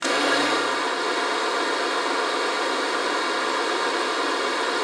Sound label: luft, alto sax; Xsample VOL-2